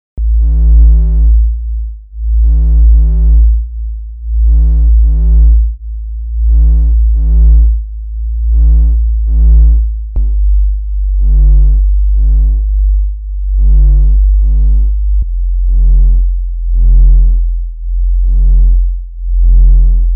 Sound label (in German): Frequenztest Unison 6 Sine; Largo